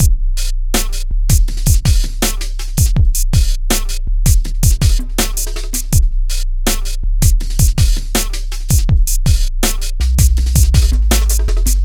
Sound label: South HipHop Drums; Pro Tools Essential Loops; Digidesign